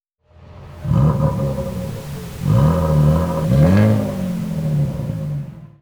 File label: Rev pink